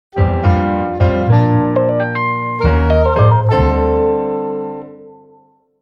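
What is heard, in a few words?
original ringtones and notifications Nokia Asha 302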